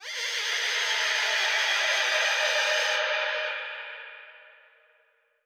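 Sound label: alarm siren, echo processed, 170bpm; musicradar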